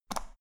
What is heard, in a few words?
power button turn on off hard fast